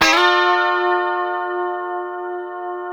E 4 D funk chord, guitars; Spectrasonics; Hans Zimmer Guitars VOL-1; 90's Sample CDs

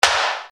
Snare Dr

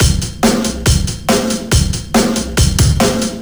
SloMo Beats, Loops 140 BPM

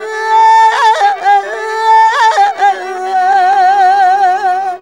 SUNG ROMANI VOX; Best Service; World Colours

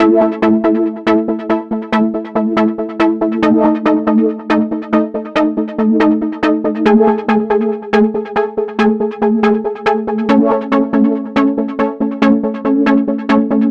NRG Pad; Sonic Foundry (Sony Creative Software); Trance NRG VOL-1

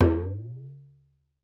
G, talking drum; Best Service ProSamples vol.55 - Retro Sampler; AKAI

